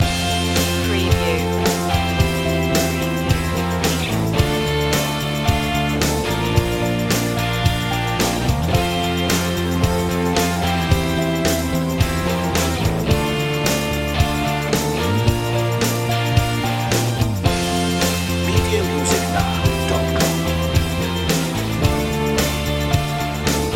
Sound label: pop presentation loop